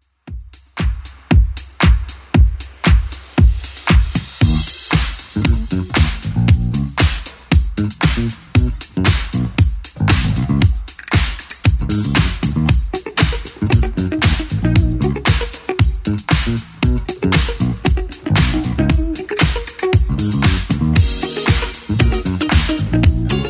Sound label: 80s Club Edit 116bpm